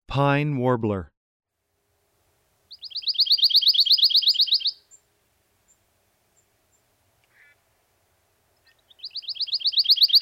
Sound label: pine warbler